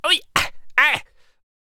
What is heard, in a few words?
Death Vocals